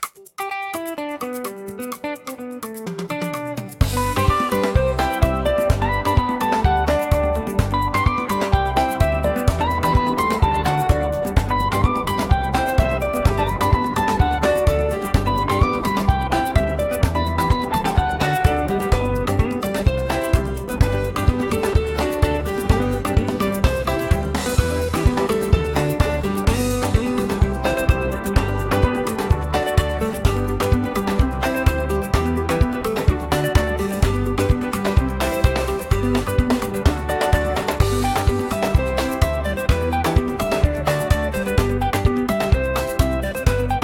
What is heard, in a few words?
Gospel Drums